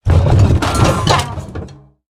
Instrument Case Play

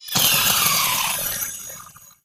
Glitter Moth Death

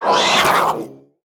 Lynx Attack

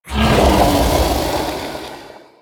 Circus Amalgam Attack

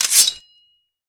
Sword Underlay

